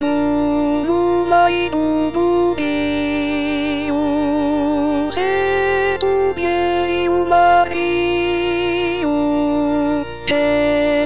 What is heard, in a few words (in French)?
bouno may a alto